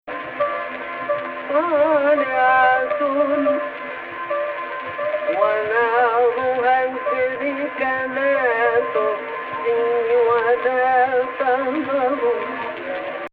AHH, Egypt Rast

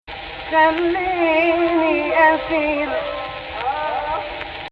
AHH, Egypt Rast

